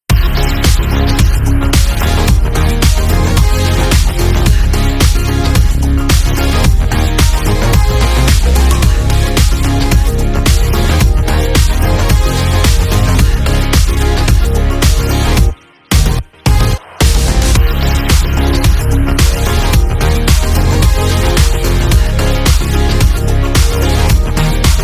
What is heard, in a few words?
instrumental